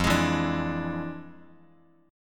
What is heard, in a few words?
F Minor Major 13th, Bass Standard; strummed; JGuitar